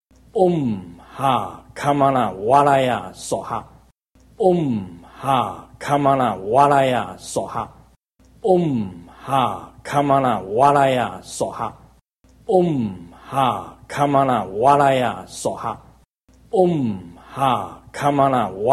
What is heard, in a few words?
Kalachakra Mantra